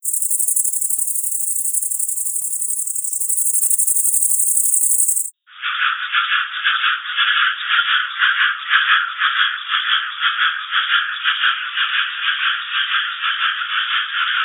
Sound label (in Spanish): grillo ultrasonico 48kHz 8kHz, canto de un grillo; grillo se puso a cantar bajo mi ventana; primavera